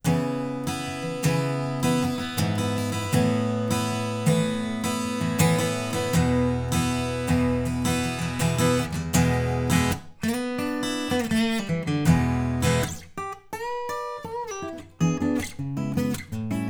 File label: Ac Gtr